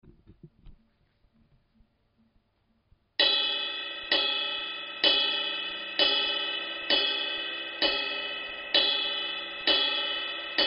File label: Masterwork Natural 20 Ping